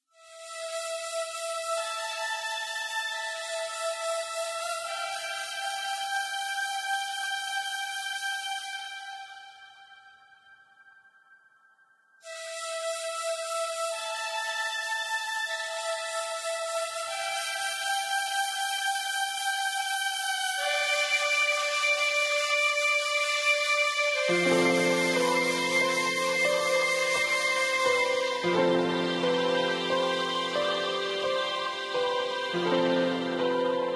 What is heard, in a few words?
Harbour Sting; ether one redux gamerip 2015